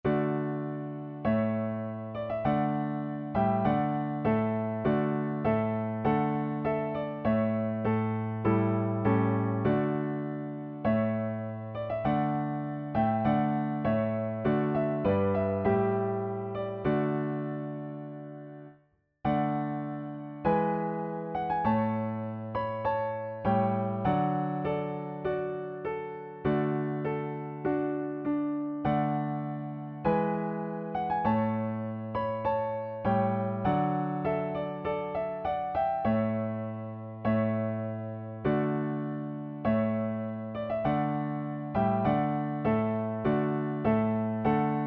Trumpet Voluntary

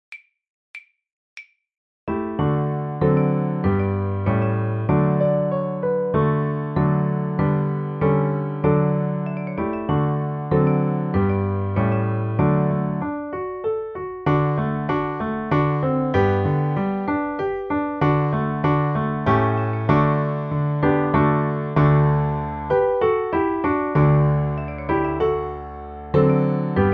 accomp, level2violin; The Violin Fun Book (Level 2); Robin Adair

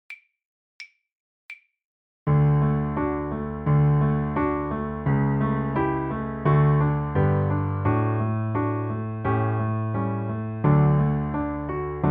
Daisy Deane accomp, violin; The Violin Fun Book (Level 2)

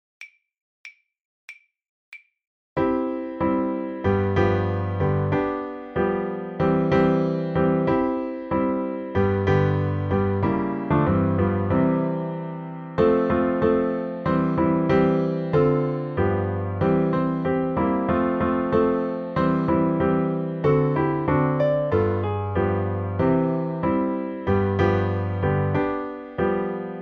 accomp, violin; The Violin Fun Book (Level 2); Go In and Out the Window

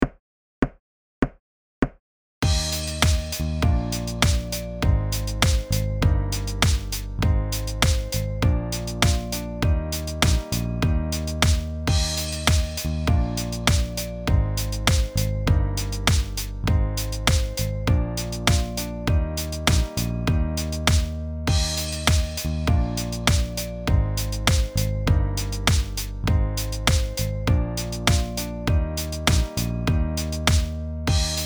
Eight Note Slide

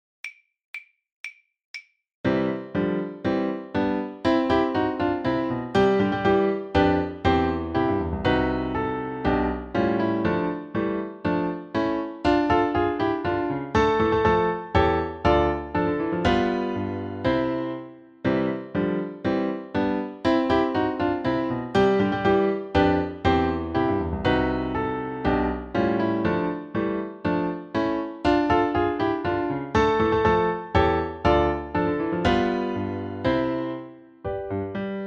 Etude accomp, level2violin; The Violin Fun Book (Level 2); Etude #6

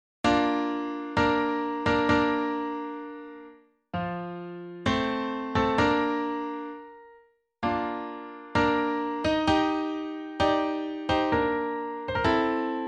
Bridal Chorus

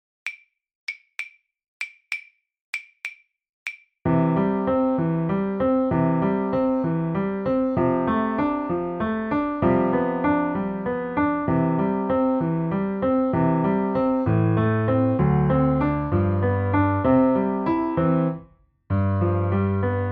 accomp, violin; The Violin Fun Book (Level 2); Lazy Mary

